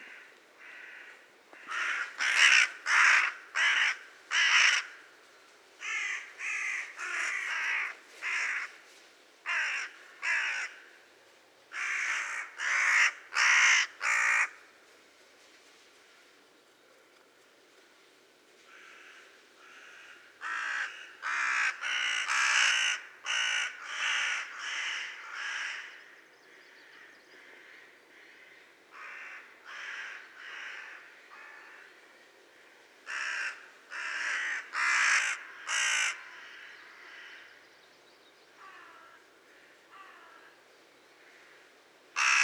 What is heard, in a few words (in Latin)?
Corvus corone; SETE